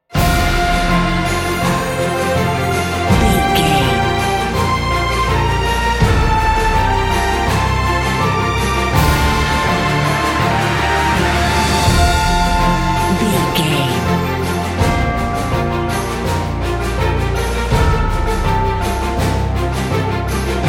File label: cinematic corporate